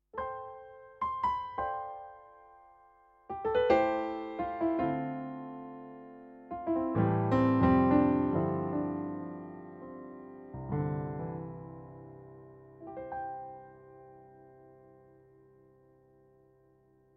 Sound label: yamaha tyros5 concert grand